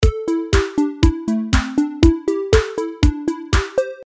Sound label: delay